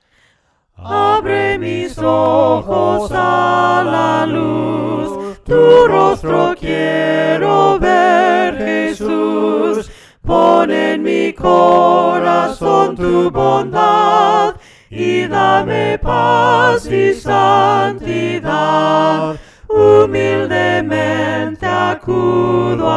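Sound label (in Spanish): VOCES, himnos a capella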